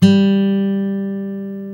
GUI Ac. G, GUIT AC; Best Service Gigapack 2

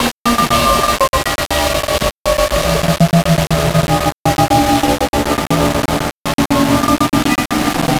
Envelope Beat; Drums & Drones

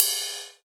Ride Cymbal; Best Service; House Definition VOL-1; Cymbal Stuff

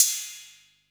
Hat, Drum & Bass

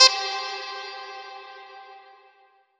House Trump Stab; Big Fish Audio; OSH Chords

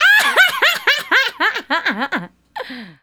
Female Laugh; Laughs; Sonic Foundry (Sony Creative Software); Vocal Toolbox

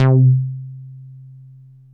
MOOG1 BASS C3

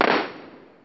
gunshot, Roland R-8

